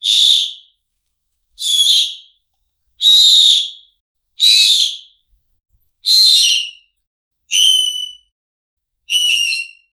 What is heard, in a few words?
chittering monkey calls